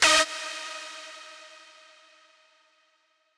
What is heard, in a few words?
lead